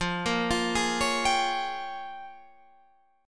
Bb Minor 13th F

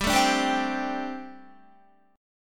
G Suspended 2nd Suspended 4th, Guitar; Gsus2sus4; strummed; 14,12,14,12,12,15

